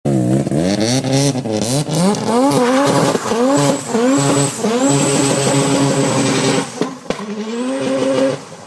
rx7 take off

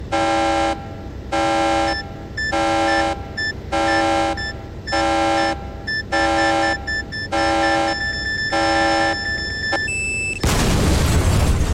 explosive tanker

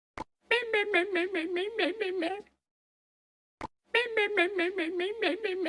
goblin crying

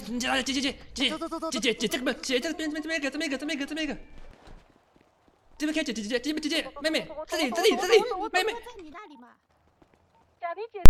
edm

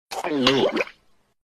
cat swallow

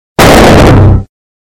error bass boosted

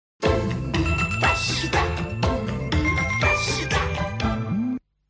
logo

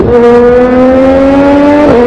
spatial inline 4 revving

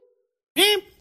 yeeps yeep

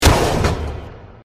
punch; tjoc

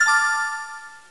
pickup

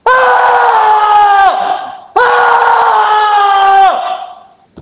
aaaaaaaa

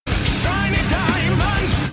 shiny diamond

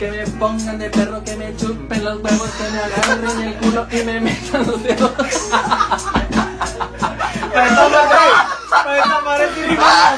base beat

